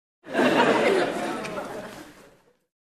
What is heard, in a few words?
Crowd in a room laughing